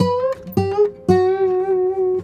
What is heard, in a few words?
Sarangi, Acoustic String; Sonic Foundry (Sony Creative Software); Friendly Fire